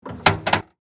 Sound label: Metal Hatch Open, Scifi, Metal, Hatch, Open, Rocket, Ship, Door, Science, Fiction; Spacecraft Sound Effects; BlastWave